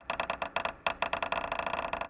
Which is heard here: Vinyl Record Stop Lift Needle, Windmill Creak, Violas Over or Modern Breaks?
Windmill Creak